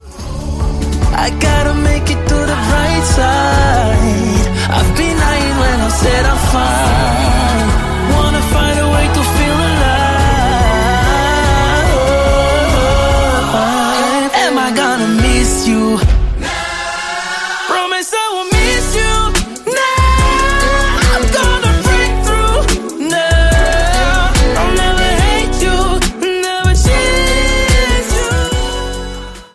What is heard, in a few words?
English Ringtone